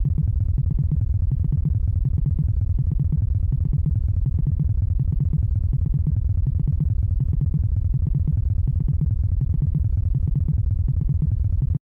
Fireball!; WC98

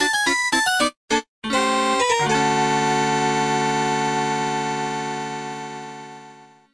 Game Over

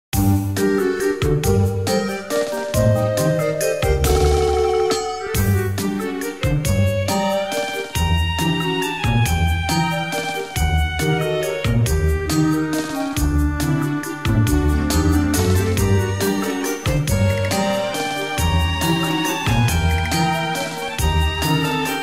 Map 4 Boos Haunted Bash; Mario Party 4 Soundtrack

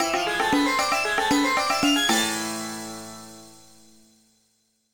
Sandstorm Steps Win

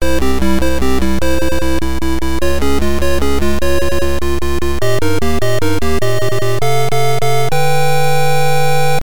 World Clear, The World Clear Theme; SMBS

